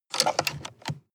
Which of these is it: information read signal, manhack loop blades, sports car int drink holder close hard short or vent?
sports car int drink holder close hard short